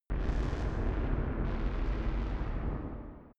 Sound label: space slow ship; raumschiff